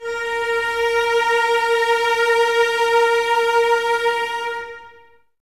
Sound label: CES A#4, String Ensembles, Cellos, Expressivo; Miroslav Vitous